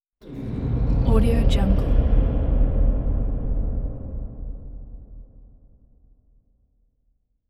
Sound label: eerie metallic spring movement; hollywoodedge